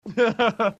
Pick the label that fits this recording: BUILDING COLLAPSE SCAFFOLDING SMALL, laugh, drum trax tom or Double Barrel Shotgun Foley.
laugh